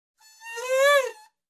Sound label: Crying Door Creak